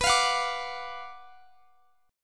F sharp Major 7th Suspended 4th Sharp 5th, B, chord